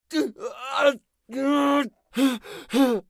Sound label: bear death; eft